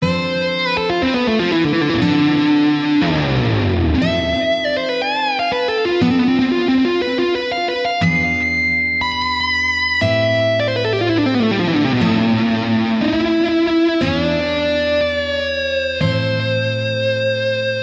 metal guitar solo

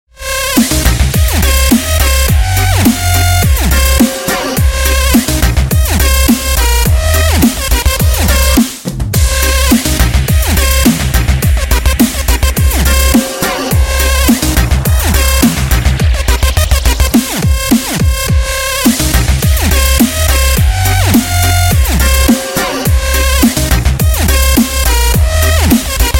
dubstep

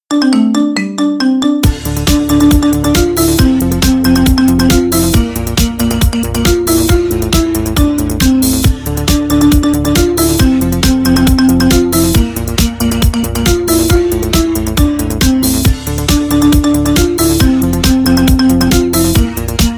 marimba remix

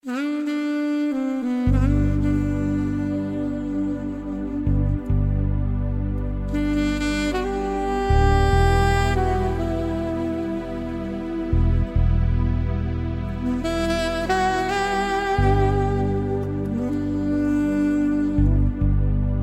in hotel trumpet

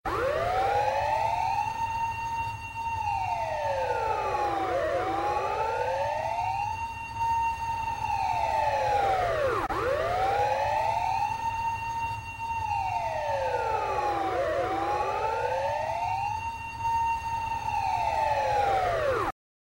siren ultimate

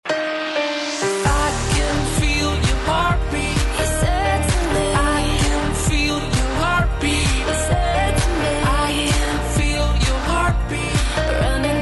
heartbeat, ringtone

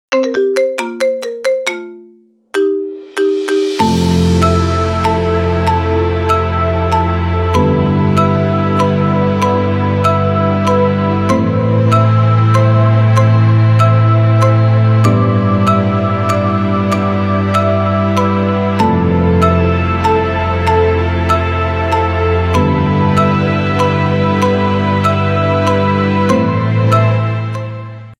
marimba remix; iphone 6 plus interstellar

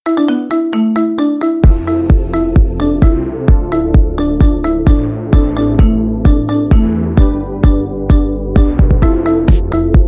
marimba remix

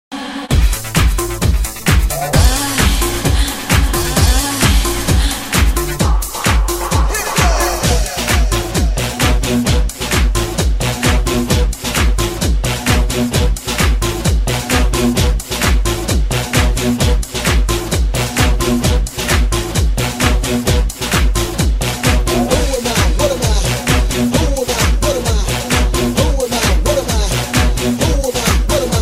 electro house

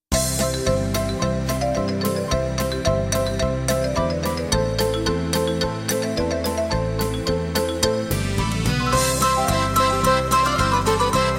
greek instrumental music

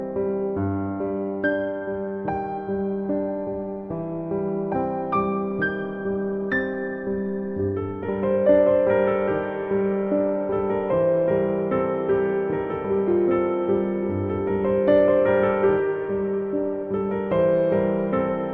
piano; silent hill

